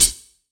Royality free high-hat tuned to the F note; short reverb high hat sound f key

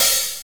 dampened open hat sample g sharp key; open hat samples

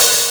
short open hat sound c sharp key

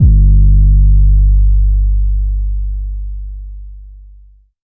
phonk kicks oneshot - 808 G, Trap kick drum; trap kick drums

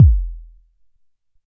round kick drum one shot c sharp key

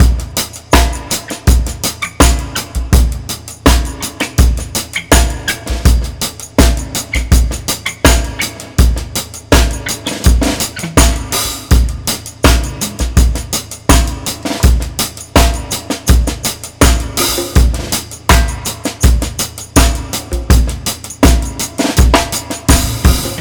82 bpm drum loop A sharp key; drum loops

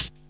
thin hat sample g sharp key

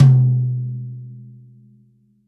big tom b key

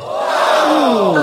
GASPING DISSAPOINTED

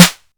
raw snare drum f key; snare drum samples

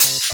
1990s natural open hi hat one shot D# key

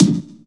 snare single hit D key

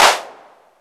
ambient clap a sharp key; percussion; single hits one shots

